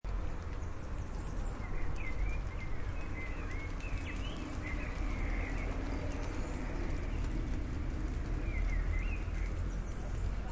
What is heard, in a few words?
birds and rain